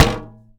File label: Hit Glass Tank Thud; SFX Kit; Foley - Misc